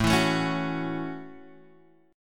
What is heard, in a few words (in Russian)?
Akkord A6 boj